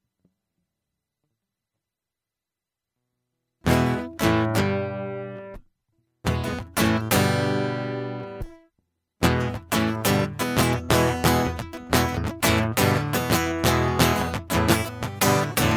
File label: Guitar Loop